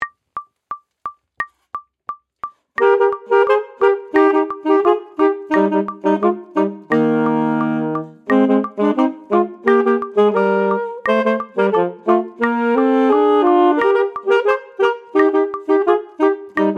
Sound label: Caribbean Duet